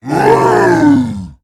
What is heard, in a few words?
Free Spirit, Play